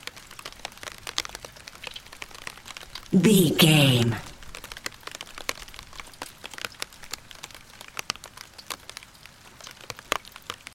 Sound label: rain light hard surface loop